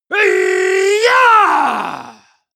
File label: male combat attack long